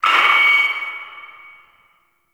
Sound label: Announcement End; Lustratus; Interrogation